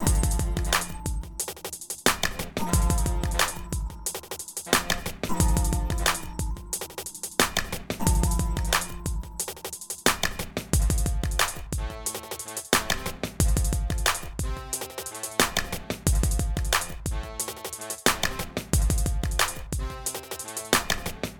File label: Drum And Brass Loop